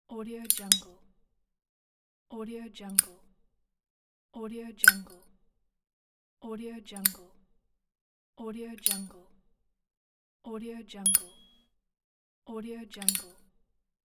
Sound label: metallic clicks; soundwood